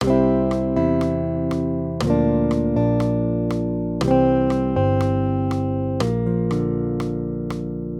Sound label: maj triads